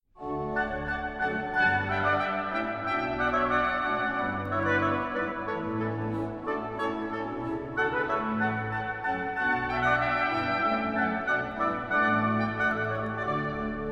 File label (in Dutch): Psalm 122 Instrumentaal